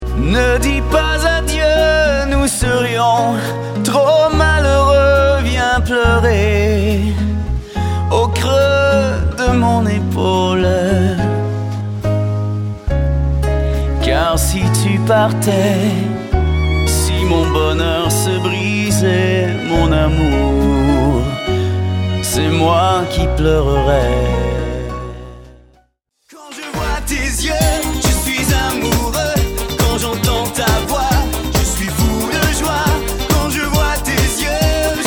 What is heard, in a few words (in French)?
Chant